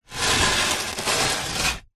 foley polystyrene thin sheet slide face down on concrete; zapsplat